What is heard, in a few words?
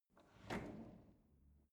drawer cutlery open draw short